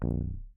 A#0, Rhodes Mark II 88 key hammered; CEPCo Sample Series Vol. 3

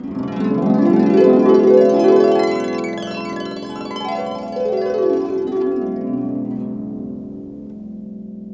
HRP GLISS; Roland - LA Composer vol. 1

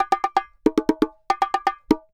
Bongo Poly 120, Drums & Percussion; Best Service; Festa Latina VOL-1

